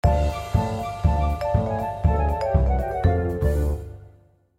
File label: Music Cantina stinger short